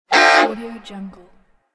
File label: quirky alerts